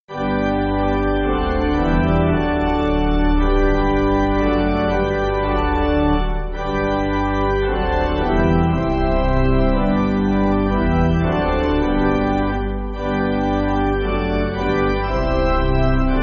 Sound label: Pipe, church music